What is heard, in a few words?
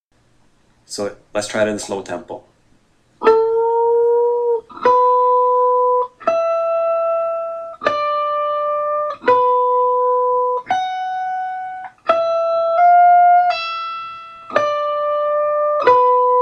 style lick